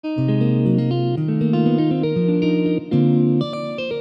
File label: chord